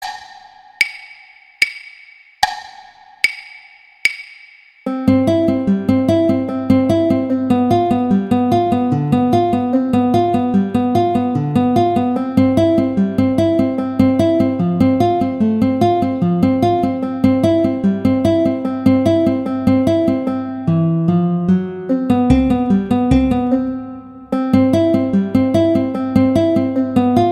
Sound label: Amoroso Violin; Paganini; Sonatas Op1 Vl Gu; Sonata No 1